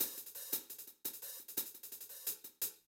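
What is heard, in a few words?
HH Loop72, hihat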